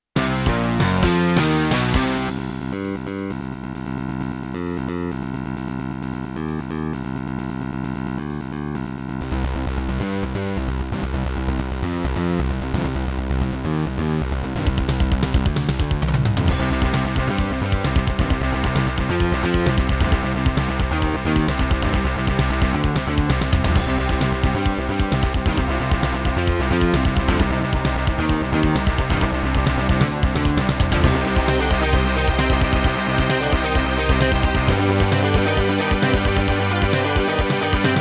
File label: Roland SC-55mkII